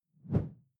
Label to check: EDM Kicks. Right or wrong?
Wrong